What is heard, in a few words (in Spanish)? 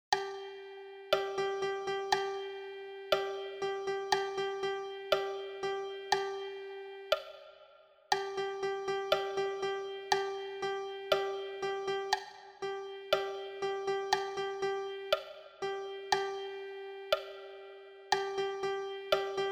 percusion